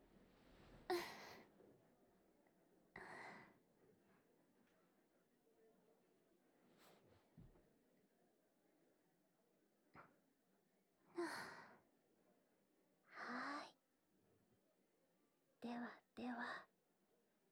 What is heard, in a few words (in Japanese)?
左耳 ふーふー; 頭の奥までくすぐっちゃいますね♪